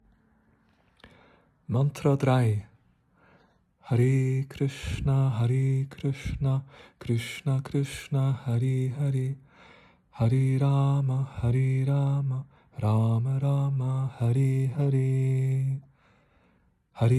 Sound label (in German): Mantra Krishna